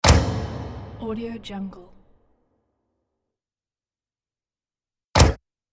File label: arena lights